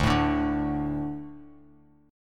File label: D 5th D, down, Bass Standard; D5 strummed; JGuitar